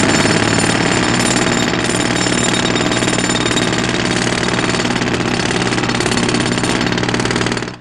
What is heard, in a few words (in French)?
MARTEAU PIQUEUR